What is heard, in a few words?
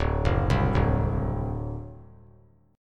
D 7th, down; D7 arpeggiated; JGuitar